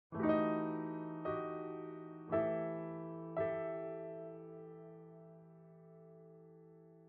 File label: Piano